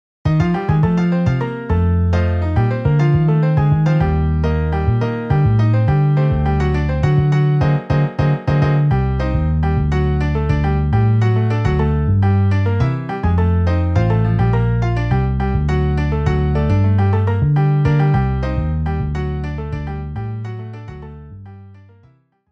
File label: Piano Bass MIDI